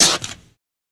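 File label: Footsteps On Wood Close Up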